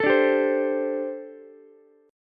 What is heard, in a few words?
G Suspended 2nd Suspended 4th, Ukulele; Gsus2sus4 strummed; JGuitar